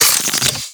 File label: Sidearm Reload; Warframe; Weapons; Tenno; Wraith